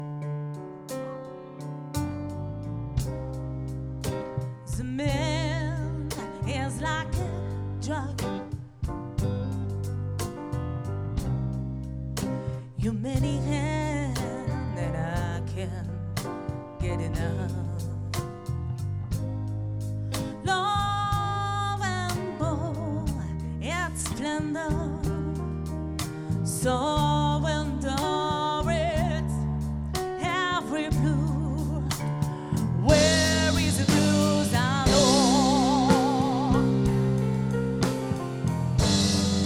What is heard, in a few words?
Baddest blues, piano bar